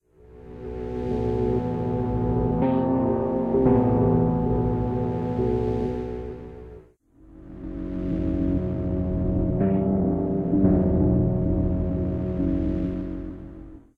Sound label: Halftime Synth; gamerip; door to door